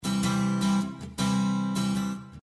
guitar

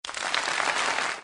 audience clapping whistling and cheering

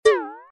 cartoon metal impact slow; zapsplat

